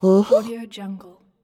girl hmm voice

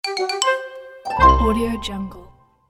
end of game

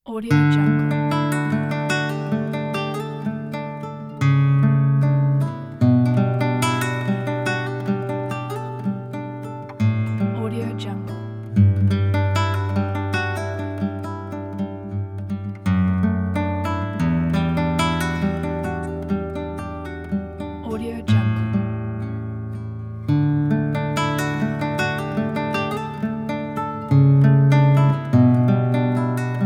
ambient relaxing inspirational guitar

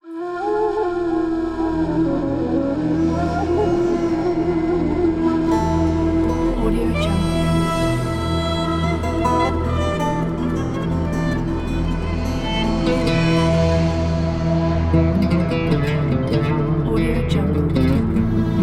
the asian logo pack; smart sounds

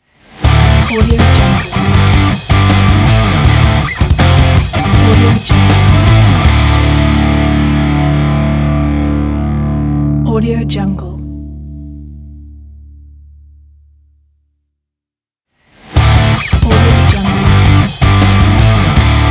metal logo

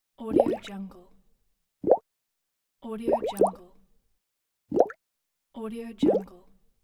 water bubble pops; bestsfx

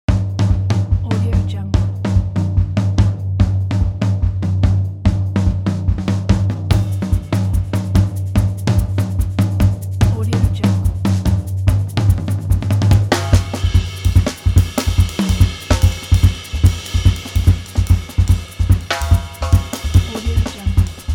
jazz drums

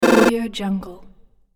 stutter glitch error

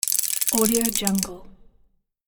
drill gear sound effect

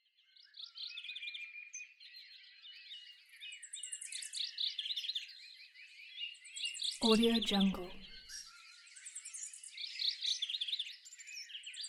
birds; stereomarket

